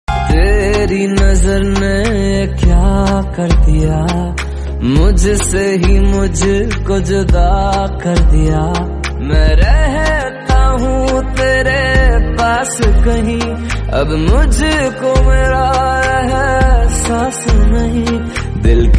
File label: Hindi Love Song